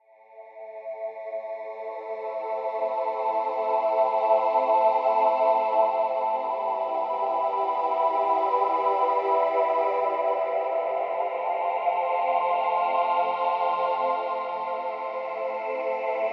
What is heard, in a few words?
phased choir; PADsynth